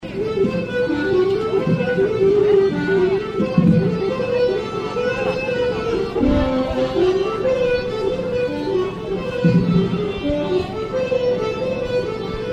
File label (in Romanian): acordeon